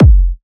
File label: Kick, Techno, One-Shots, Drums